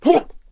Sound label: Death Vocals